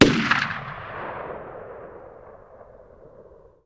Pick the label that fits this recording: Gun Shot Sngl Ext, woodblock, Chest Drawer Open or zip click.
Gun Shot Sngl Ext